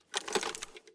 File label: pkm draw